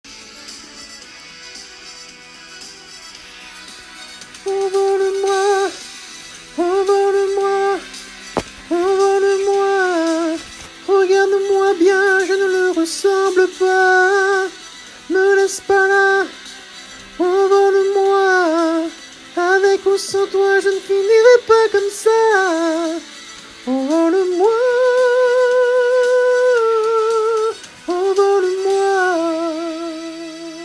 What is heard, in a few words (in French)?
fin harmo, Harmonie refrain de fin